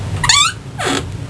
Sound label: Door Opening then Squeaking; Soundsation